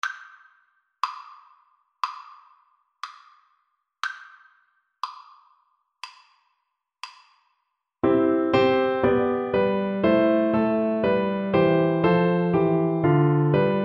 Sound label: sonata, Cello; marcello; op2 no5 mvt1